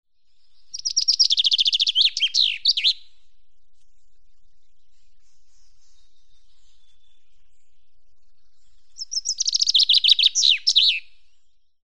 erdei pinty, madárhang

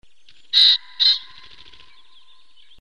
fácán, madárhang

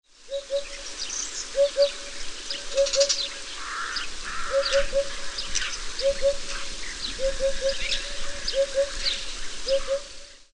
bubos banka, madarhang

